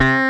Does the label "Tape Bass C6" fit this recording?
Yes